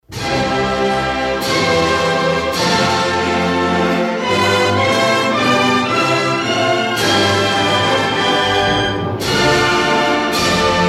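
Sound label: anthem instrumental; Russia; anthem 1990 glin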